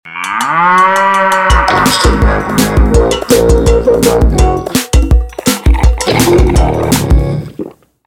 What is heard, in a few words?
Music Win; Fluffy Horde